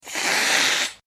cat hissing

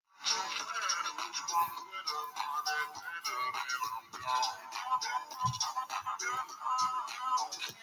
slowed and bass boosted

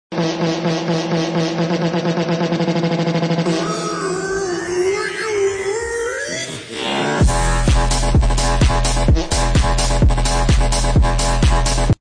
fart techno mix

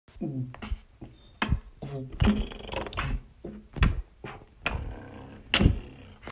food beatbox

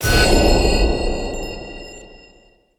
party heal; vestal

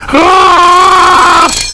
face scream; nick jr

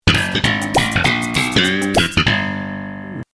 sitcom transition bass riff; seinfeld

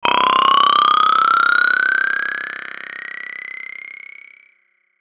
sweep sweep

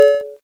cowbell

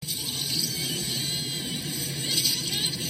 crying; willow geer

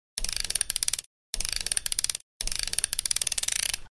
wind up, music box

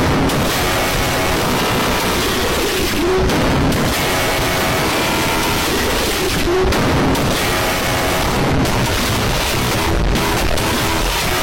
water honk

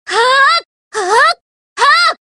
hit; kasumi

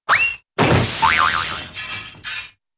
whistle streak out glass shatters and boing

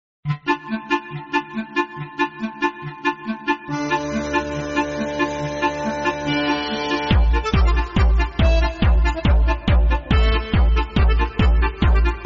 outro music; markiplier

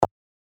ui click

